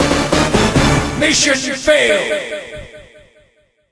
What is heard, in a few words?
8 bit die vo